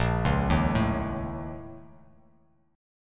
Bb Minor Major 7th Double Flat 5th, Bass Standard; BbmM7bb5; arpeggiated; 6,4,1,2; JGuitar

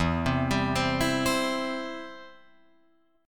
E Minor Sharp 5th, Guitar, Open F; Em#5 arpeggiated; 4,7,7,7,7,7; JGuitar

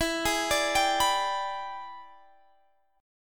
E 7th Sharp 9th Guitar Standard, chord; E7#9 arpeggiated; JGuitar

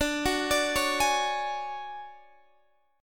D Major 7th Flat 5th Guitar DADGAD, chord

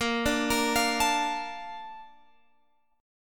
Bb Major 7th, Guitar, Open G; 20,19,20,22,22,x

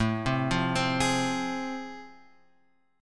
A Minor Major 7th Sharp 5th; AmM7#5 Chord {5 3 3 5 X 4} arpeggiated

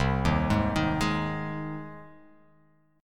C Minor Sharp 5th, chord; arpeggiated; JGuitar

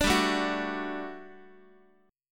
G Major 7th Suspended 2nd G down Guitar; GM7sus2 strummed; x,x,5,5,1,5

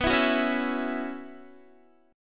B Suspended 2nd Suspended 4th, Guitar; Bsus2sus4; strummed

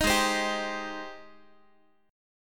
Gsharp Major 7th, Guitar; strummed; 18,16,15,17,x,x; 4A,4E,5A,5D,5G#,6C#; G#M7

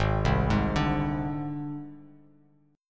Ab Major Flat 5th Ab Bass Standard; AbMb5 arpeggiated; JGuitar

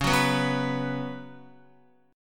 Db 7th Suspended 4th Guitar; x,4,6,4,6,7; 4F,4A,5C,5G,5C,6E; strummed; chord shape; JGuitar

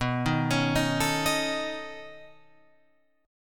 B 9th B down Guitar Open F; B9 arpeggiated; JGuitar

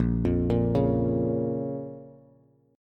A sharp Minor A sharp down Bass; arpeggiated; JGuitar

